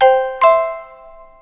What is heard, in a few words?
Quest Completed